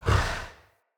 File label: Camel Idle Breath; Khaban Camel Vendor; Vocalizations